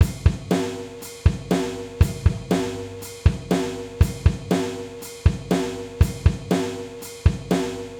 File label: acoustic drum